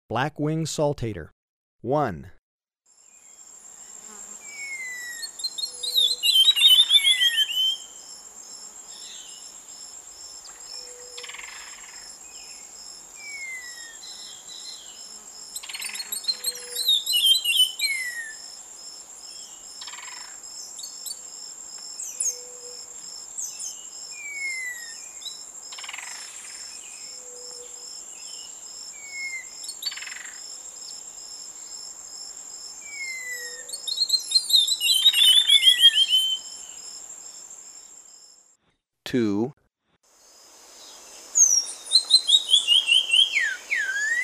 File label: Black winged Saltator, Birds of Ecuador; S. America